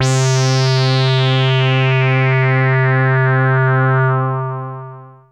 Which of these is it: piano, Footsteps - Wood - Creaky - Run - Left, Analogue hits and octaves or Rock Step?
Analogue hits and octaves